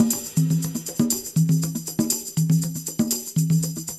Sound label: Percussion, R&B Drums